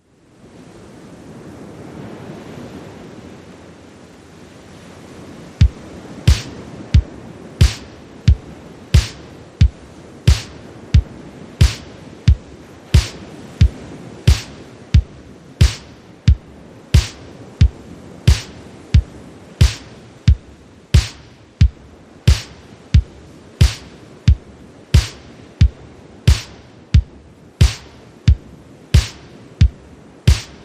Drums

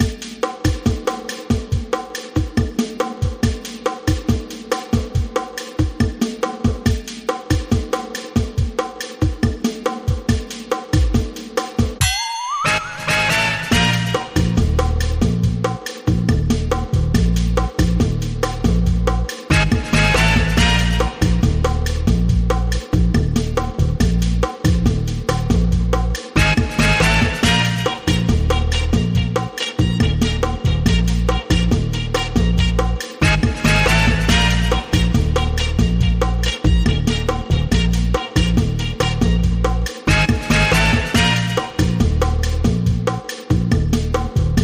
Soda Bop

